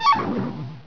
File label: sorcery; amphetamine